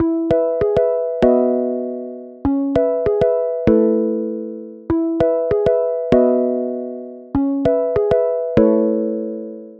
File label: Pluck Pop E 98; Sample Banks; Melody; Keyboards